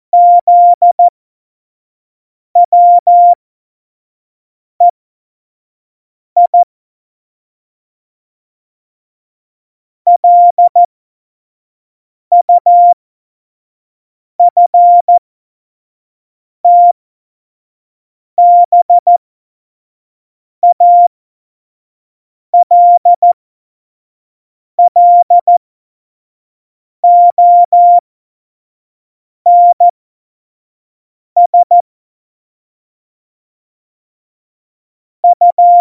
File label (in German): Morsekurs, Tempo 25; Witze